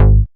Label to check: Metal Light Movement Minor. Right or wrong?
Wrong